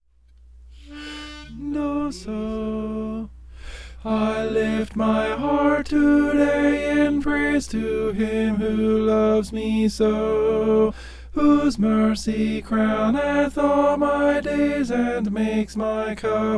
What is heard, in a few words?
tenor